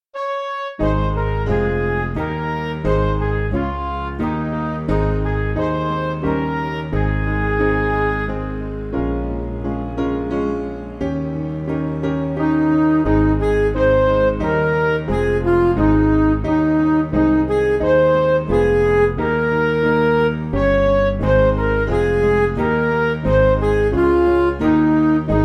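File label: Music Backing Files for Churches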